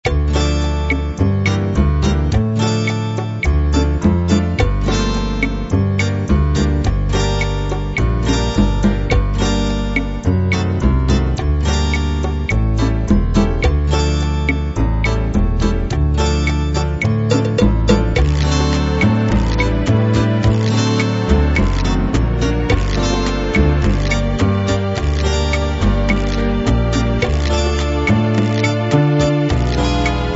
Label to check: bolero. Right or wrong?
Right